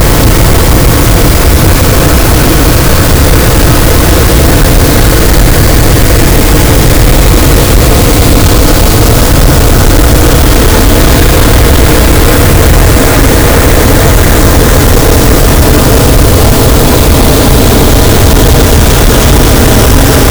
cachalot, gaussian noise; Physeter Macrocephalus

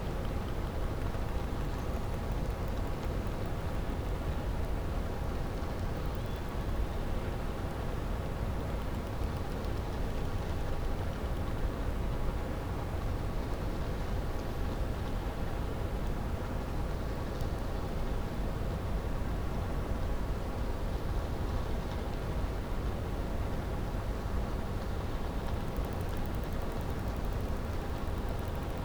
cachalot negatif, Physeter Macrocephalus

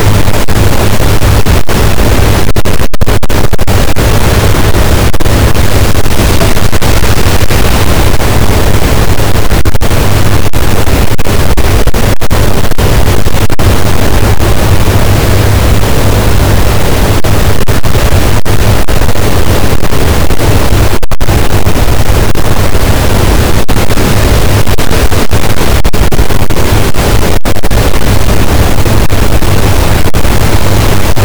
cachalot, gaussian noise; Physeter Macrocephalus

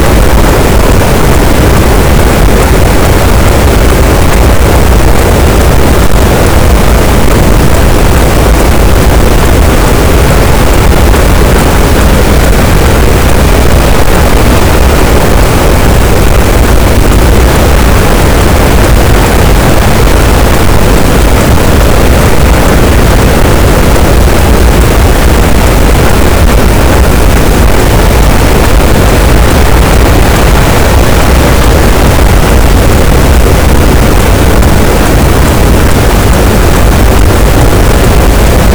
cachalot, Physeter Macrocephalus, gaussian noise

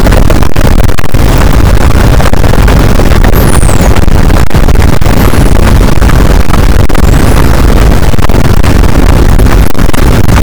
cachalot positif, boat; Physeter Macrocephalus

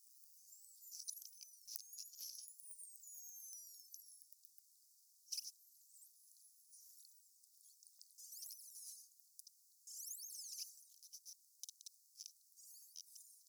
voc mix; globi

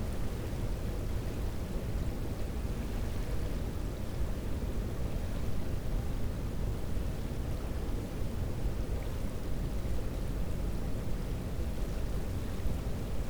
cachalot negatif, Physeter Macrocephalus

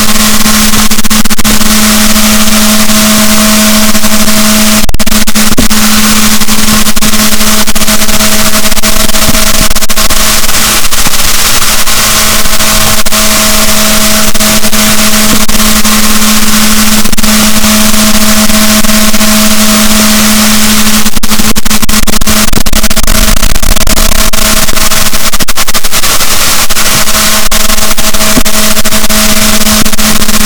rorquals, boat, Balaenoptera Physalus; SNR 0dB

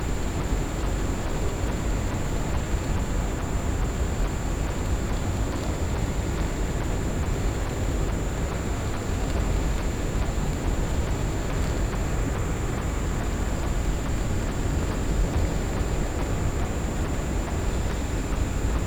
cachalot negatif, Physeter Macrocephalus

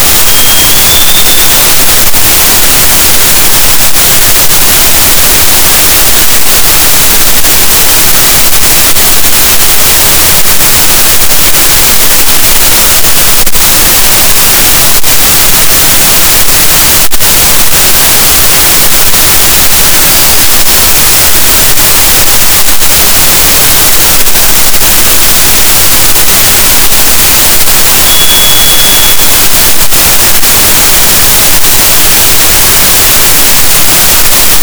cachalot, gaussian noise; Physeter Macrocephalus

